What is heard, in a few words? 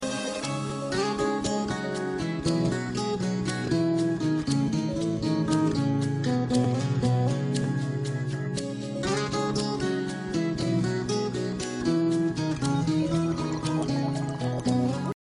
instrumental